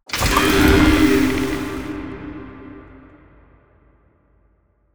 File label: bonus Game Enter; netent